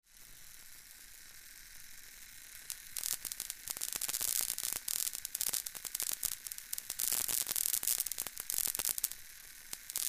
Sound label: Tesla Coil Servo